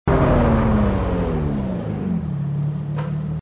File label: throttle off; TDMCarpack; TDMCars